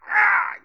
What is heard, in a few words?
Pain